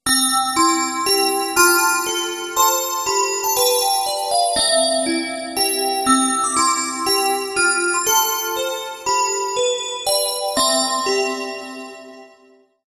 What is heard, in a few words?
Xylophone; HTC 5800 Bell